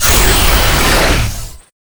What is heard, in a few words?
melee; Quake 4 Alpha; id software; strogg; valkaryne